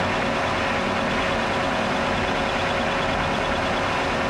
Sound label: agricultural tower grappler orient loop; Factorio